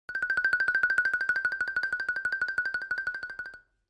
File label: Xylophone Trill 3 Lon, mallets xylophone